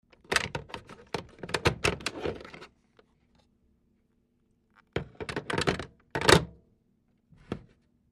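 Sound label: Telephone Fumbled Pick Up Hang Up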